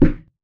impact Punch medium

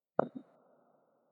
3D Put Down; Martian Chess Audio

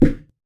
impact Punch medium

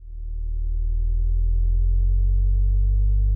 whirr up; Martian Chess Audio

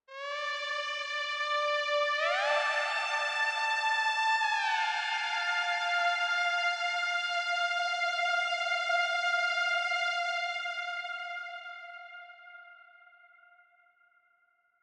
Lead Synth; Martian Chess Audio

